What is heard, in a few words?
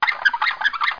squeaky wheel; DR BRAIN; BUGS